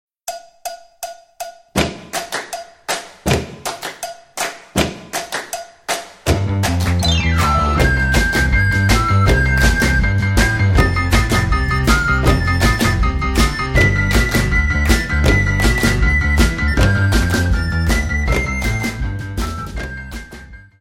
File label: Instrumental